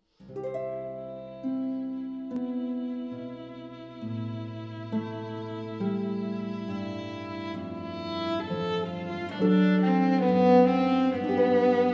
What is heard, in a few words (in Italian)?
arpa violino